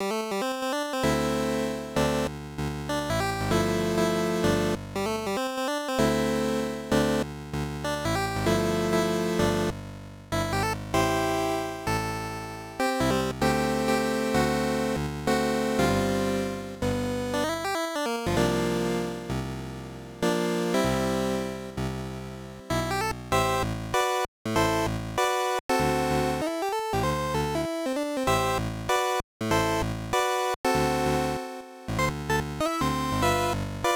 BGM; King of Casino; TurboGrafx-16

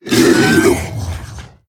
Zombie Monster Die; Undead

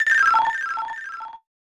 Selection Jingle; megaman 7 original soundtrack

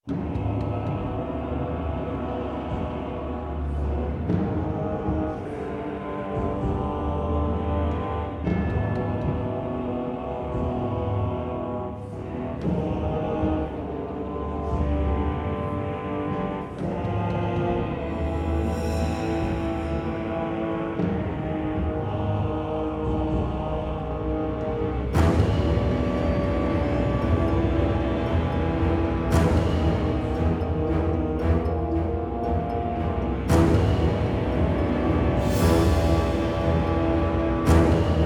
Horror; dragons dogma dark arisen gamerip 2016

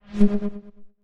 holster

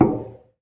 footstep metal

